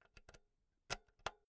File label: insert jack cable slow